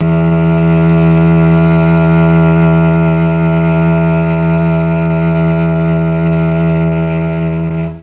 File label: E2 mellotron cello